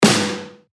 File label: Snare; Lake; revealed claps snares vol 2